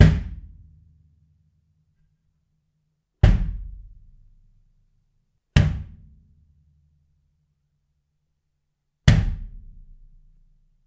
Gretsch Catalina 22 Kick Reso Head No Hole

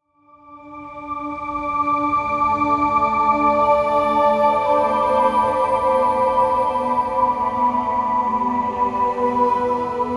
Other Side Of Light Ambience

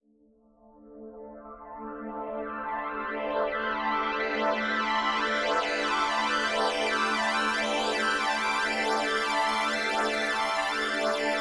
Abstract Strings A minor 123bpm

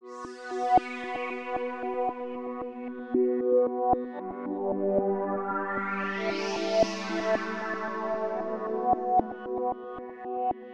Space Intro

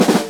Drum roll Snare Short

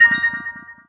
Success Jingle

